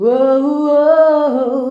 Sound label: UOUOUOH, FEMALES